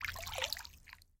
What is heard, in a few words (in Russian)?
voda kupanie dalekij aktivnij